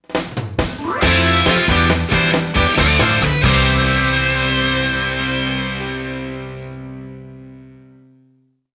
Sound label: Victory Boss Battle; Virtua Quest